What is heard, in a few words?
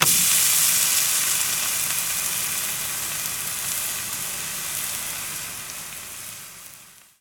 Sizzling Steak